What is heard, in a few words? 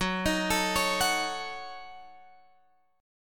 F sharp Minor 6th, Guitar Drop D